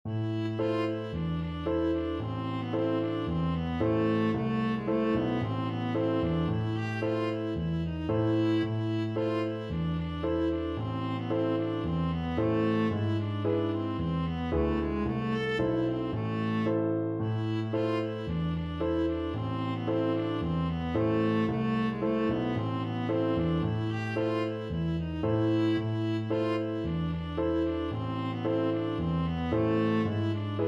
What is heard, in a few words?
syrtos, viola; simiotises